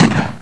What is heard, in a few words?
FIRE; AVALON